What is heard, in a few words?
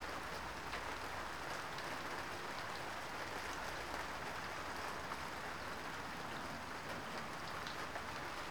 rain pleasant